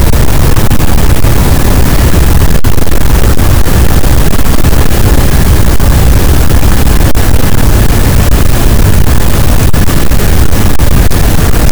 cachalot, Physeter Macrocephalus, gaussian noise

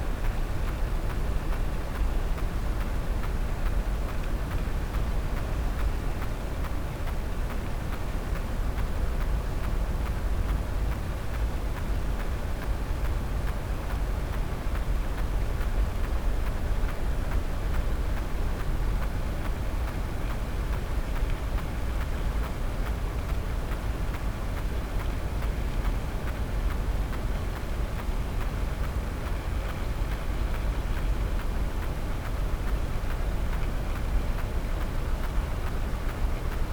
cachalot negatif, Physeter Macrocephalus

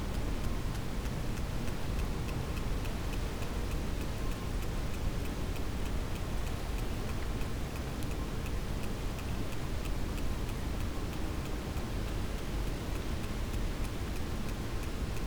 cachalot positif, Physeter Macrocephalus

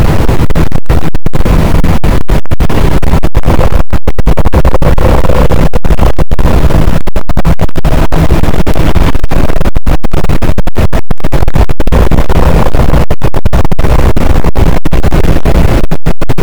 cachalot negatif, boat, Physeter Macrocephalus

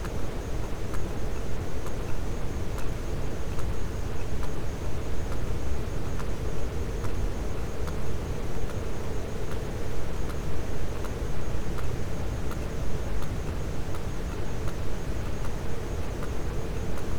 cachalot positif, Physeter Macrocephalus

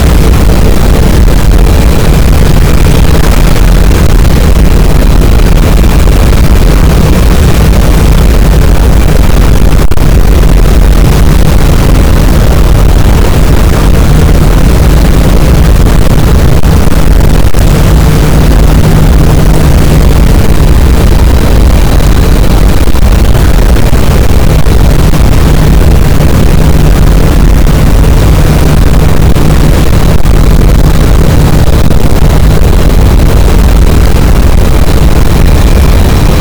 cachalot, boat; Physeter Macrocephalus

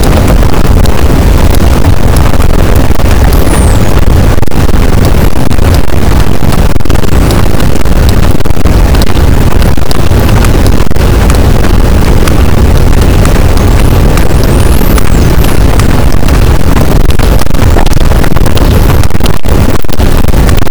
cachalot positif, boat, Physeter Macrocephalus

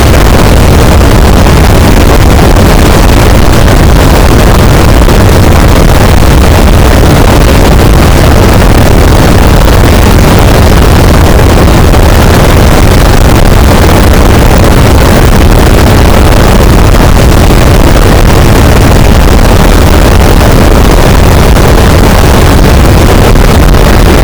cachalot positif, boat; Physeter Macrocephalus

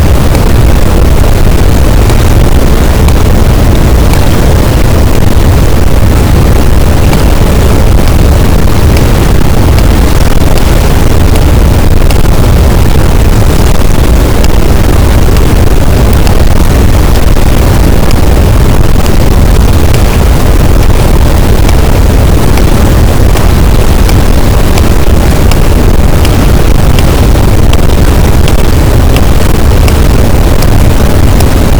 cachalot positif, boat, Physeter Macrocephalus

